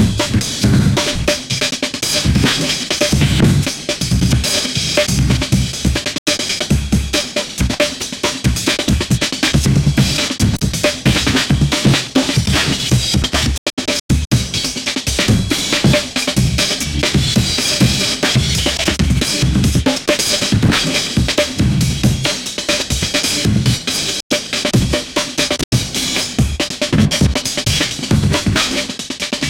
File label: amen break for breakcore